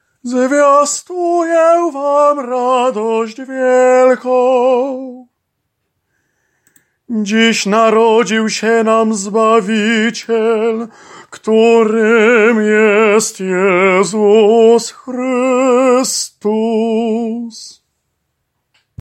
Alleluja Pasterka SOPRAN

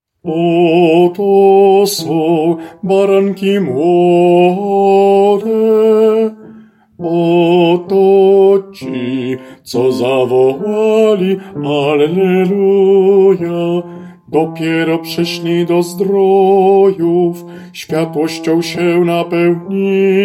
Oto sa baranki mlode BAS

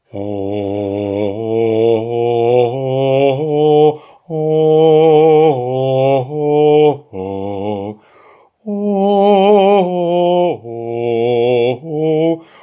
BAS zwrotka; Stala Matka

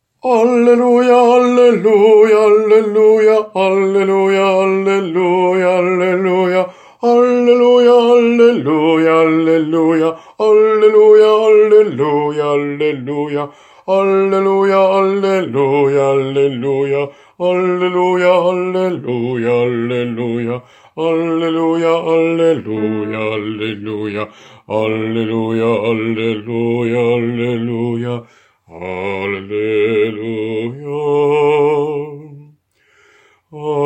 Alleluja BAS

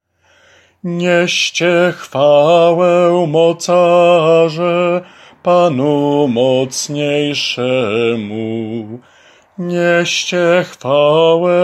Niescie chwale ALT

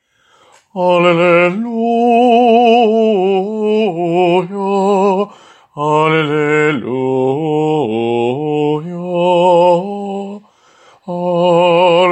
Alleluja BAS

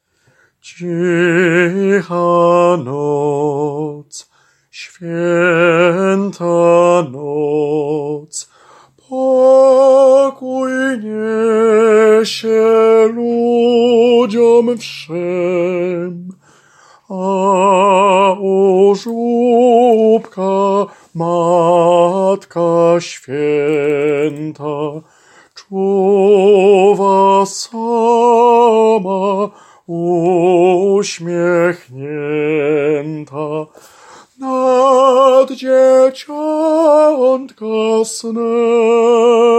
Cicha noc SOPRAN

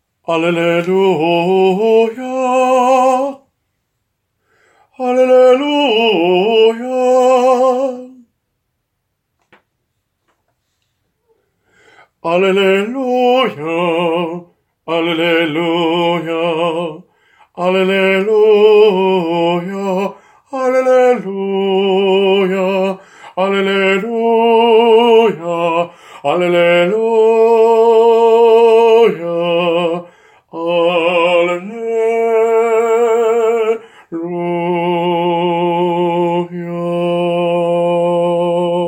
Alleluja SALT; Mawby

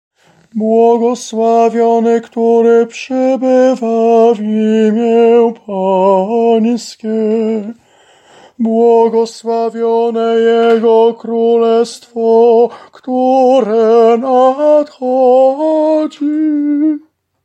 Aklamacja TENOR; Niedziela

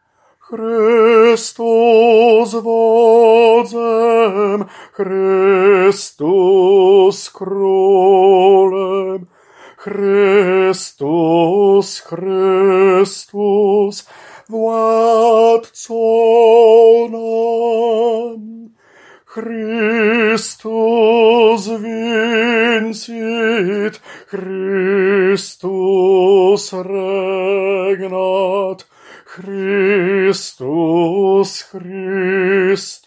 Chrystus Wodzem, Tenor

